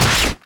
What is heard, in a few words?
claw